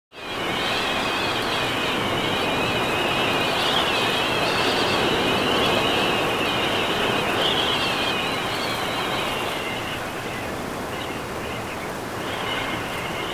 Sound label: Ambience (Temple); donkey kong country returns 3ds wii wii u 2010